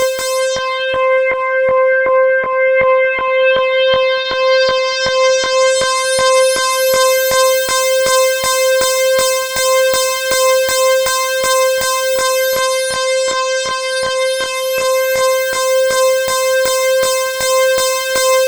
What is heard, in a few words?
C5, SynthLine; 90 s Sample CDs; NiceBeats; SynthLine VOL-1